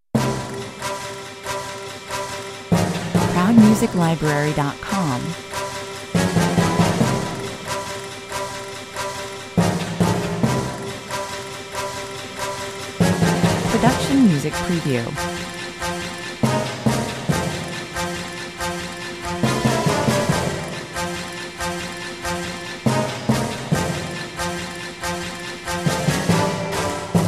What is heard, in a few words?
spanish flight